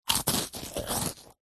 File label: human eat bite crunch cracker single